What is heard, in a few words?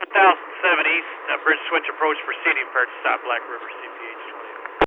Bridge Switch